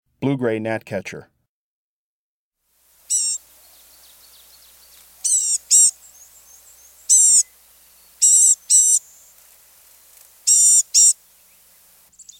Blue-Gray Gnatcatcher, Birds of the Chicago Region